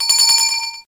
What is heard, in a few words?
Ready Bell